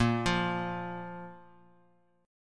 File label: A sharp 5th chord; arpeggiated; x,x,8,10; JGuitar